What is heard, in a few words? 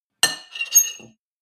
cutlery on plate scrape squeak; berufe; gastronomie; soundpool